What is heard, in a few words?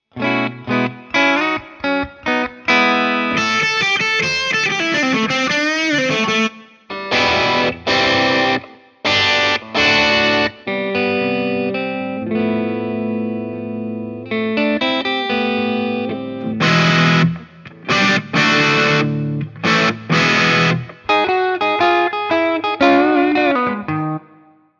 guitar amp